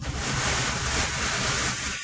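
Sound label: sound of rustling plastic bag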